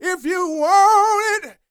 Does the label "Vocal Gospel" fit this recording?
Yes